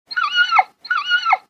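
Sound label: inu kyuun, dog whine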